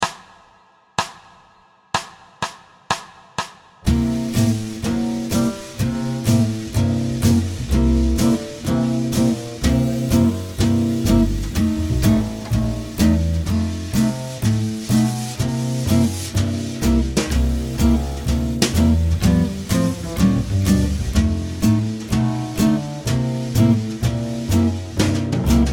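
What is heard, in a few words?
12 Bar Swing C 120 piano; Blues Swing C 120; Piano Practice Tracks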